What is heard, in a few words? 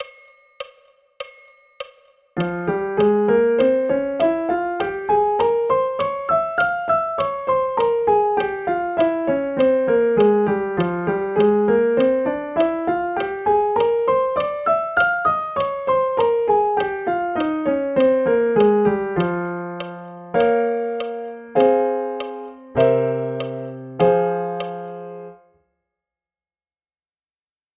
F minor scale and arpeggio; bastien